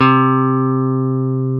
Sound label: GTR, Clean; Club-50 - Foundations Roland